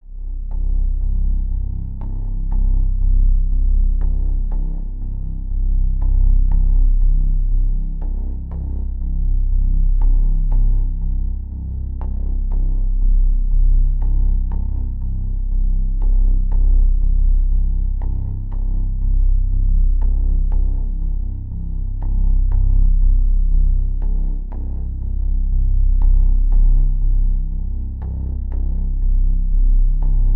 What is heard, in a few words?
Drone Bed Pulsing Tension; Production Element Imaging; AdobeCC